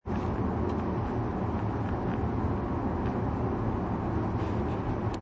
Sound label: Road 35mph